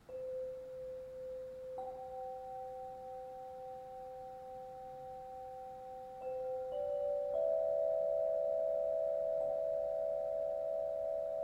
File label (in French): Voix et lyre de cristal